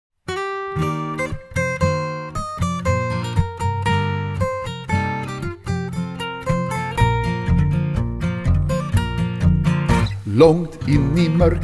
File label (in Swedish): polkan; glasblasarpolkan